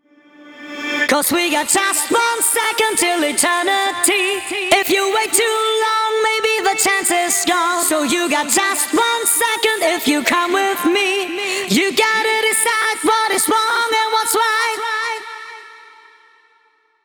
Male Vocals Chorus WET, 140 BPM; Vengeance Samples; Song Kits; One Second

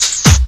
drums, combi drums; PS-28 Vocal House Samples

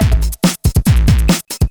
Breakbeat; Vengeance Samples; VEC2 Loops

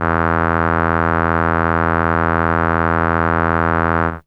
Horn E2, ARP Solina, Unmodulated; musicradar-synth-samples

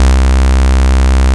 Basses C; Vengeance Samples

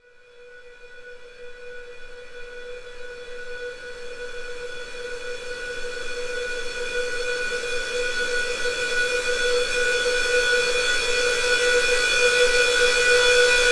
140BPM Hard Square B, Melody Fills; 8Bars; Vengeance Samples

